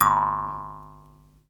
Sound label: JEWS HARP; Jew's Harp & Spoons